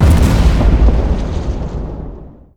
explosion big; weapons; Stellaris

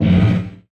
distance energy lance fire, weapons; Stellaris

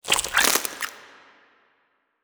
small legs up down; biogenesis

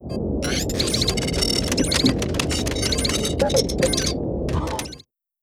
event administrative work; Stellaris

